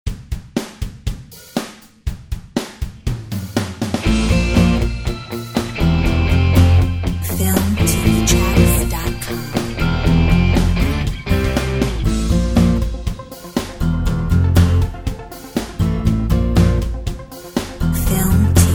Rock sound

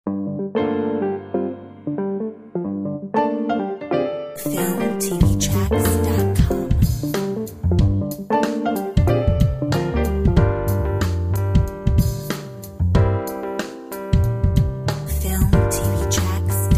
So Jazz

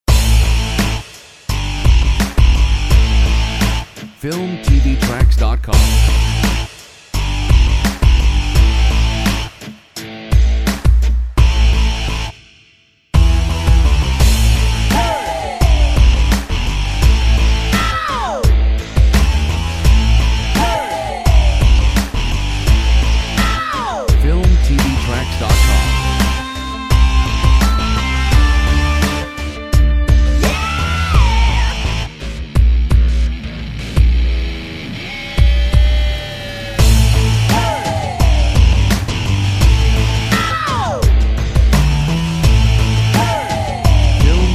Energetic Indie Rock

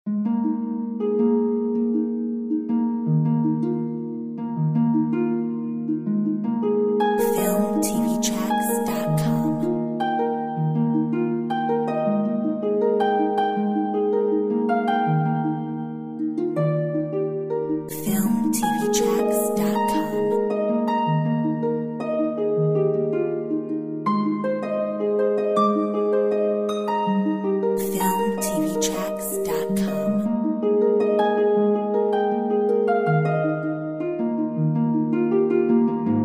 Angels Harp

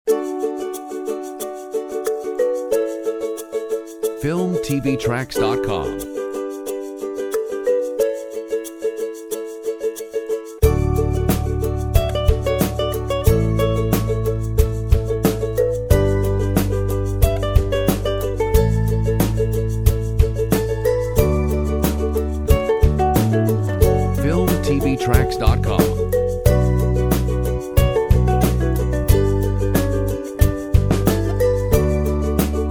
Ukulele

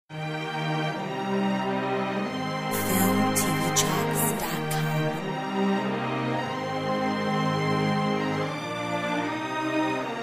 Just one teardrop

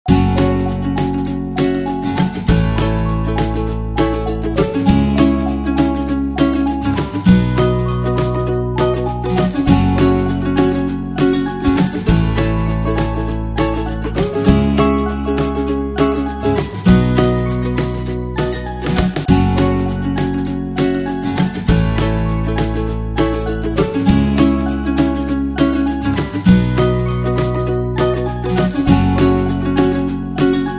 Happy guitar

